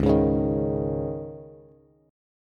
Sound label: C sharp Suspended 2nd, Bass; strummed; 9,11,11,8